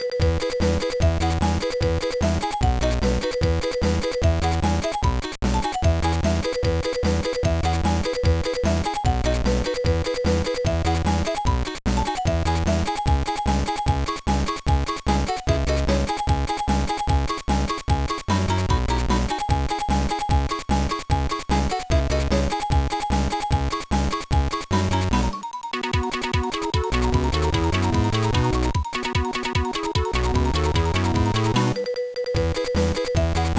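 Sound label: background music; No No No Puzzle Chailien; GBA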